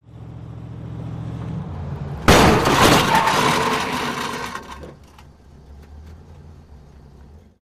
Drop Impact Moving Vehicle Collides With Stationary Vehicle In Reverse Gear, Great Metal Scrapes